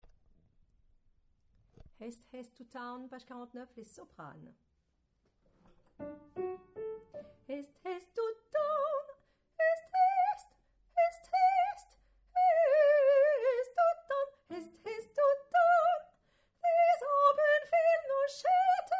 Soprano

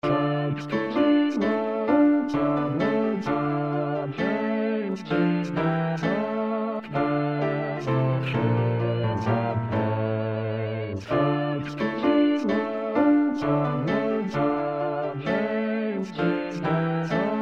thanks to these lonesome vales Basse

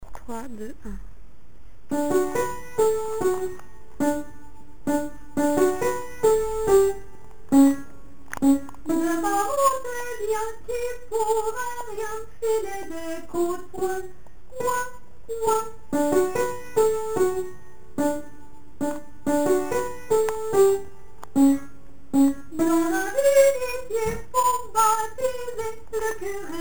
Soprano

